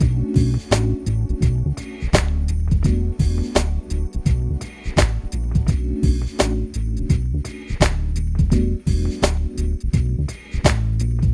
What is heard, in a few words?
SYNTH 85; E-MU Formula 4000 Series Vol. 1; Hip Hop Nation; TriggerThis! 85